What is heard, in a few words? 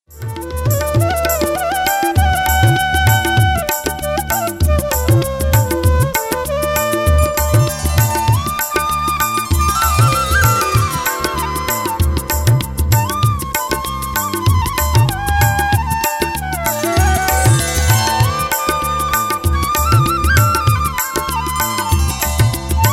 Sangeet (Karaoke music track)